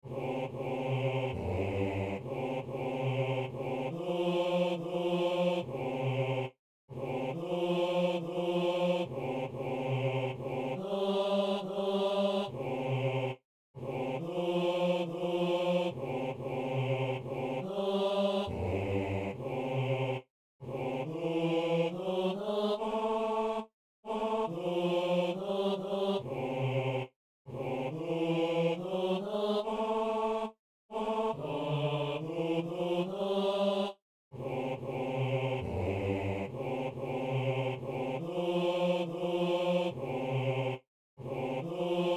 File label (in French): hommes